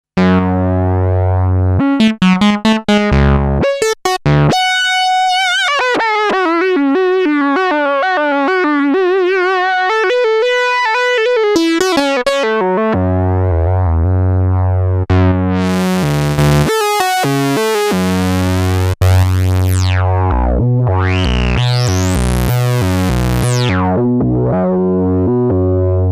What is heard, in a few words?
SEM Lead